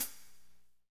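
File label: hi-hat, Pop Country, Dry; Northstar; Drumscapes Roland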